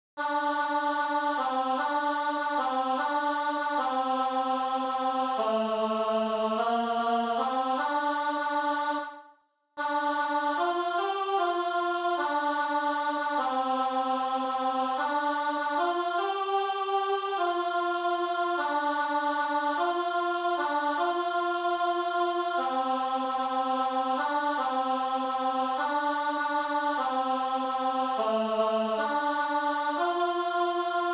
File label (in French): puer natus alto; l'enfant nous est ne